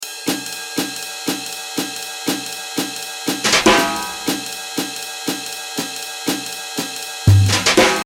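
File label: drum jazz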